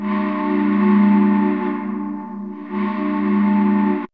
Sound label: LOW HOT, SYNTH FX; AMG - Vince Clarke Lucky Bastard